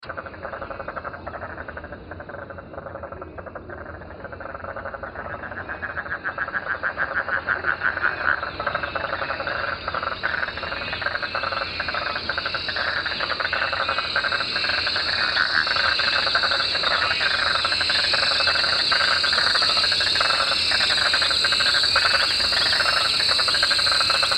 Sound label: Swamp